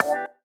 G STAB, funky; Loopmasters; Funky Underground Vol 2

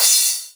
Crash, Cymbals

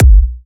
Bassdrum

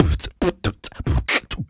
Human Beat Box, 140; Loopmasters

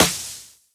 Snare, Dubstep One-Shot Drums

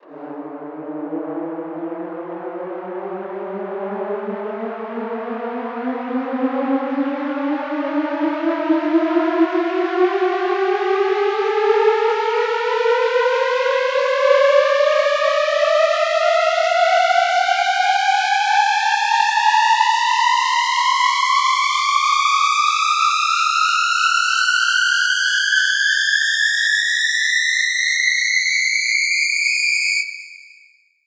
FX Uplifter

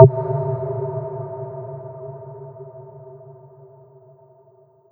FX Impact

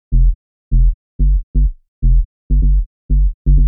126 F BASS, Funky Underground bass loops; Loopmasters; Funky Underground Vol 2

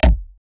Percussive

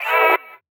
E VOX STAB; funky; Loopmasters; Funky Underground Vol 2

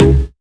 Synth Shot C#; Mutekki Media; Vengeance Electroshock Vol.2

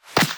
Claps & Snares

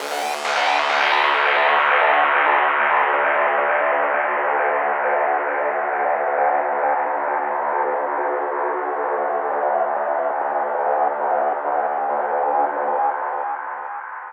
Bpm134 Busy Fx Arp D, Dubstep; FX Loops

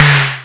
Snare, Dubstep One-Shot Drums

